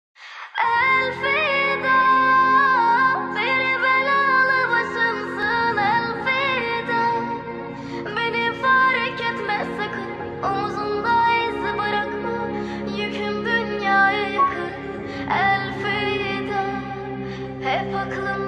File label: deep house remix